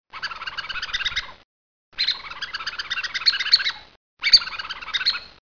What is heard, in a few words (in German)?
huhn